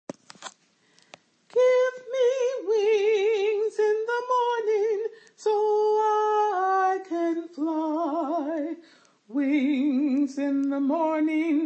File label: Descant; OaxacaCanta